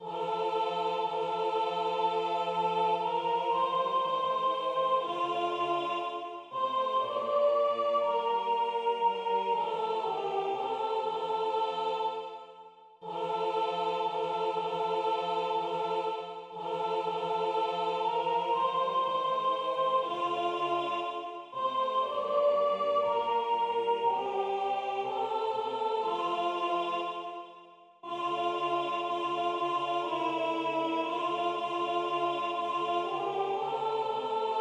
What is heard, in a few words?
Intercession Sopran